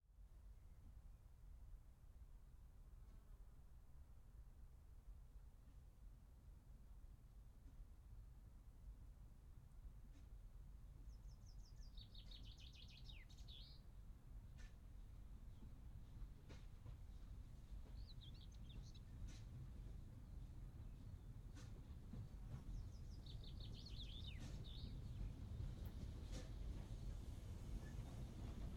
Steam Train Pass by, Chuffing, Rail Clatter, Birds, Voices, Slow, Medium Distant; Tone Glow Libraries; Steam Trains